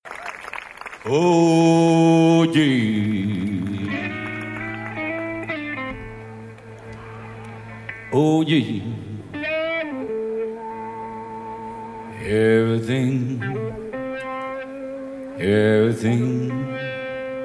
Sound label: BLUES BAND